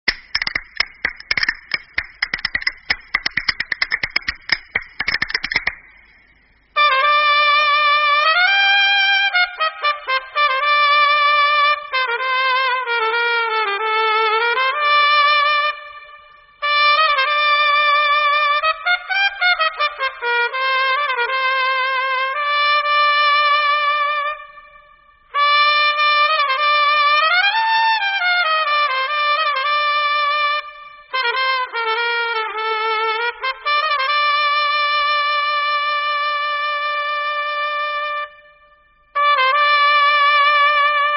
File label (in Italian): bolero e flamenco